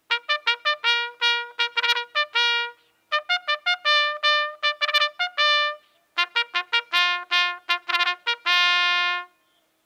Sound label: Table aka Mess Call, Cycling Bugle; henry keat & sons; Bugle Calls; c1880 Cycling Bugle